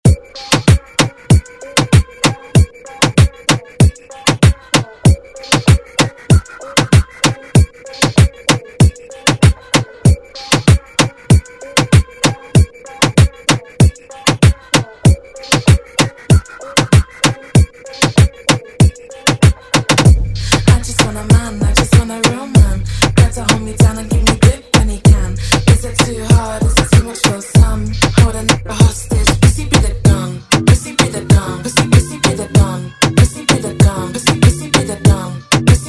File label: Moombahton Redrum